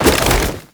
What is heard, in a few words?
Infested Jetpack Jump Woosh; Warframe